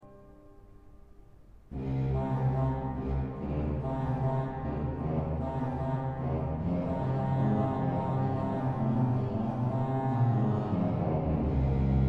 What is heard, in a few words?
Basse de Trompette